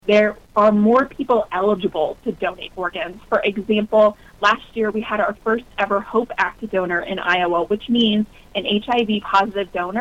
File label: organ